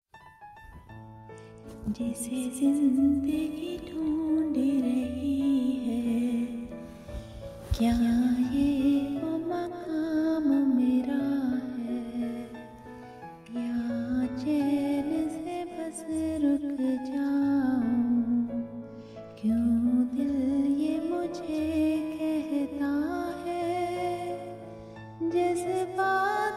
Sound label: valentine day romantic ringtone